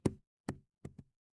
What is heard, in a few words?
impact bounce